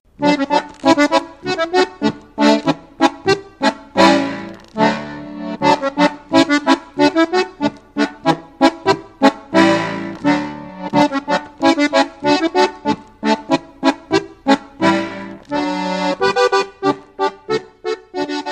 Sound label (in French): Hulaner, Autriche